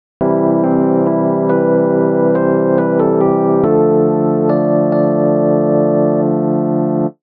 メロディー

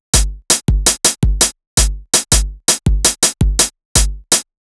ベルパターン

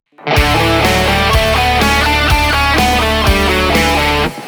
メジャースケール

